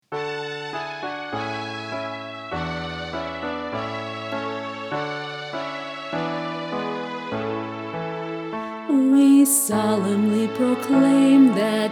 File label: Vocal